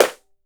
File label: hot snare one shot c key; tuned drums c key